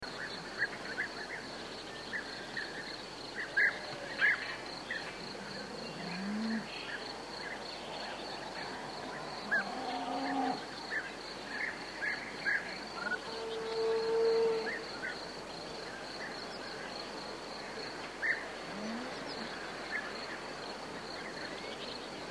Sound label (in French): amb foret